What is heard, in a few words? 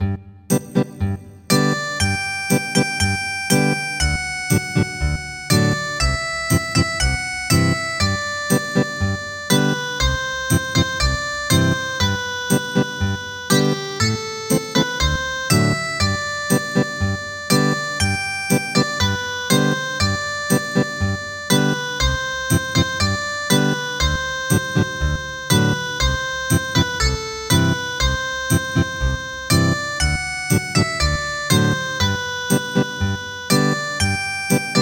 Ethnic Guitar; Dondong opo salak